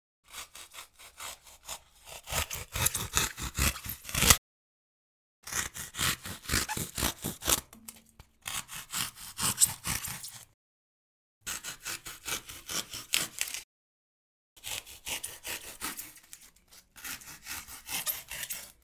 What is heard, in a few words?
Cutting Foam